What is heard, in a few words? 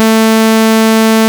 Ob Saw, Synths; Multisamples; Ableton Live 12 Lite; Core Library